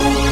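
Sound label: synthesizer Dance; Best Service Gigapack 1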